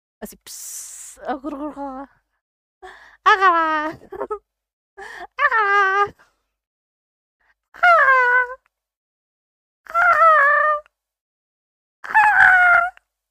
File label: psssss